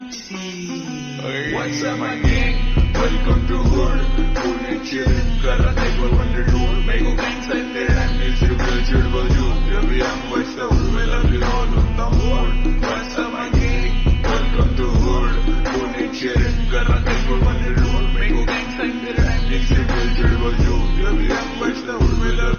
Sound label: Ringtone Slowed Reverb